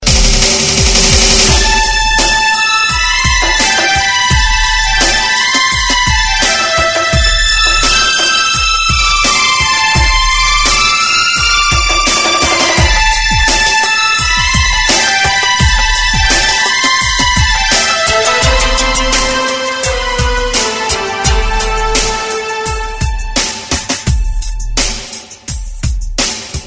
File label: Rock; Airtel New